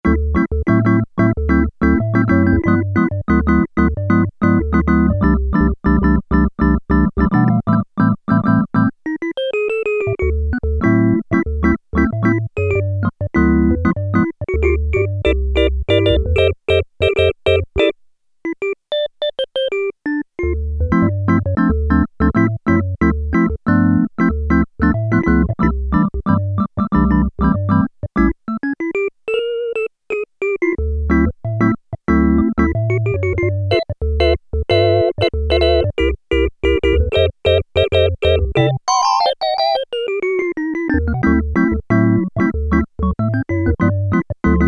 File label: Percussive Organ; Sequential Circuits Prophet-5 (Ver.3); Factory Patch